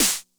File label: Med Tune High Snappy, 909 snare drum; classic machines; 909mod